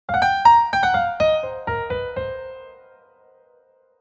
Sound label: blues lick